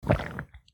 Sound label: survival thirst drink; hunger; mcl hunger